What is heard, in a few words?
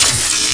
el sprk, electricity; SINDEMO; environment